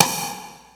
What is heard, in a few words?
perc, Percussion; Trance; Drums; Trance Explosion Vol1